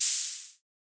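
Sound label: GEM15 hi-hat, GEM Drum15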